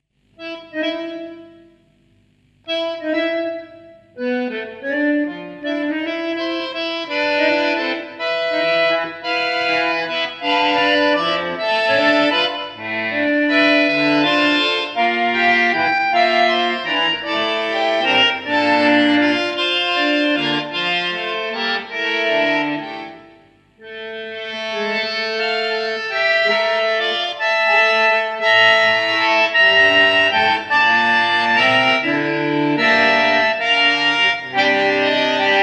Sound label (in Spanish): Prel La bandoneon; BANDONEON SOLO